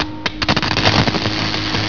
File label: ARCWELD